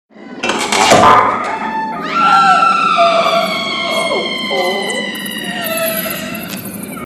tieng thet trong dem

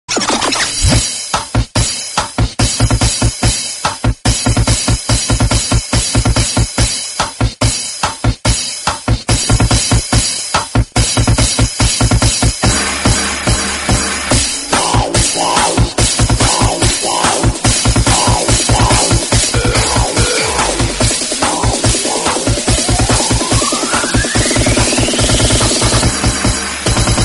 tiếng múa lân vui nhộn

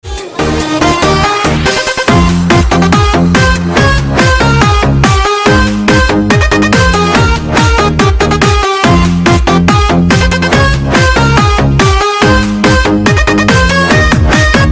nonstop nhạc bay

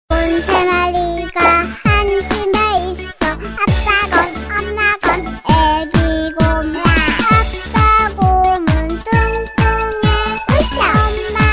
Nhac chuong Han Quoc cute

